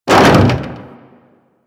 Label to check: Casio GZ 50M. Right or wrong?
Wrong